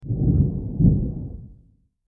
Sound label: thunderstorm